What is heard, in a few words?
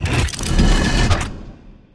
pharaoh Door; hiddencoinsofegypt